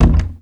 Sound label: Perc; Sonic Foundry (Sony Creative Software); Esoterik Beatz; Mecan Kit